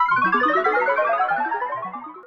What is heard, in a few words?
dlg open